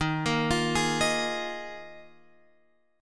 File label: Eb Suspended 2nd Suspended 4th, Guitar, Open F; Ebsus2sus4; arpeggiated